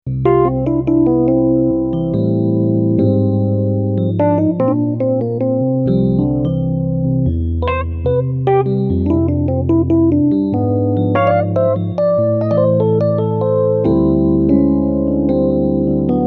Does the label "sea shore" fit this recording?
No